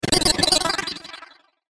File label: BLEEPS SWEEPER; Beep; Multimedia; Beeps